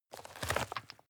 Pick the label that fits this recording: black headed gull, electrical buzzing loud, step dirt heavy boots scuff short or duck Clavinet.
step dirt heavy boots scuff short